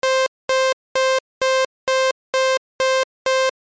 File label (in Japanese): ノコギリ波4分打ち